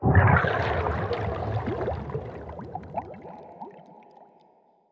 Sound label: Underwater Death Underlay